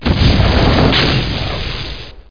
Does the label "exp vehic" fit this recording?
Yes